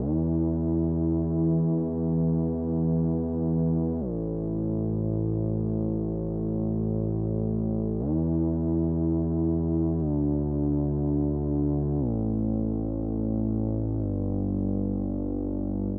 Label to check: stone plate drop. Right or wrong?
Wrong